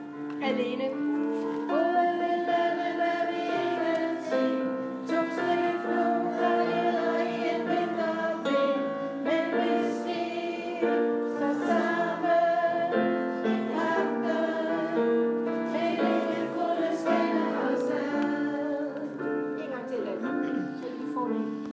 pagten omkvæd alt

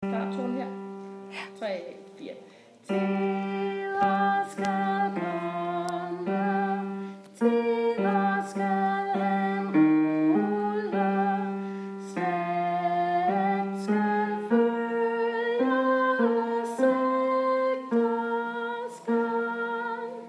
dejlig er jorden tenor

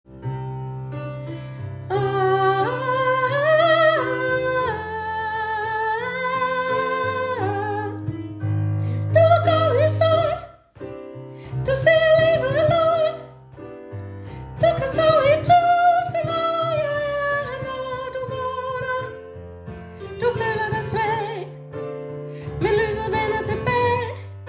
i morgen er der også en dag tenor omkvæd

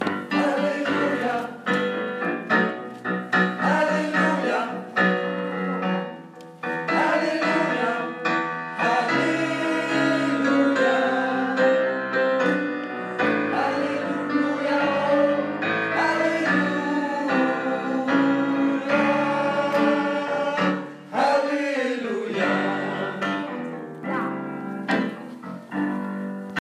halleluja tenor

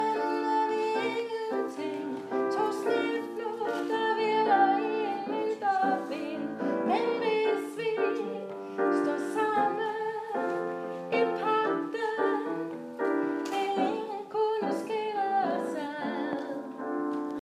pagten omkvæd sopran

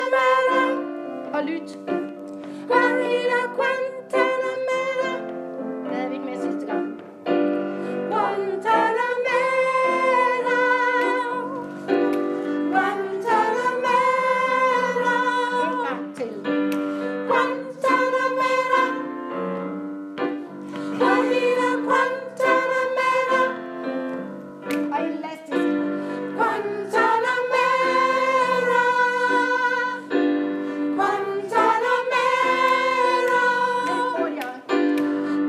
høj sopran

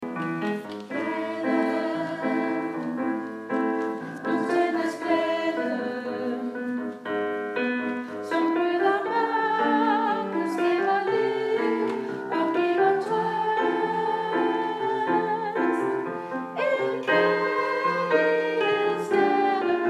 glæden alt

